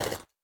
Parry State; Folk Hero

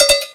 Sfx Wintergames; kinect sports season two; xbox 360

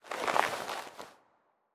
Hit Summon monster

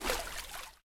Water Up

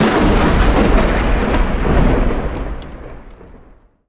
Dark Magus Thunder

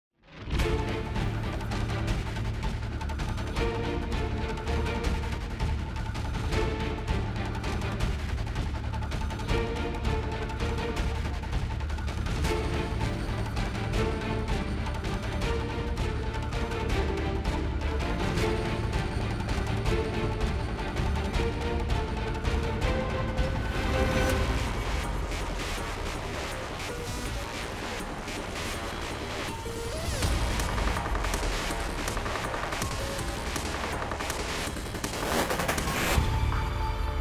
Event Suspense Heavy

